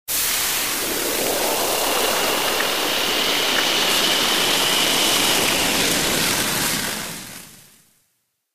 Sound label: sky wind